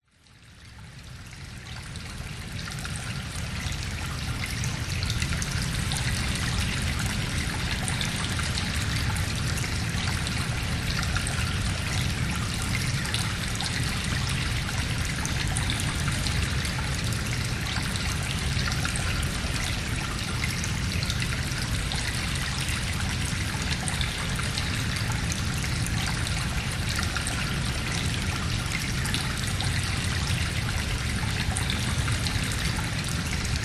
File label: nature cave ambience water stream flowing reverberant wind howl and rumble outside